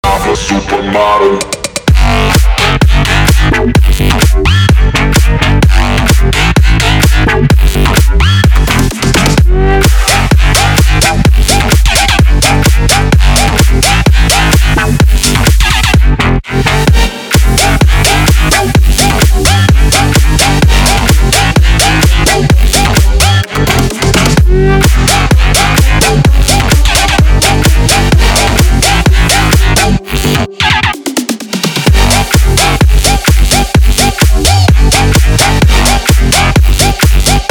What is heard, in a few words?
bass house